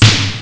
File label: Snare Drum; ST-XX Amiga Soundtracker Sample Packs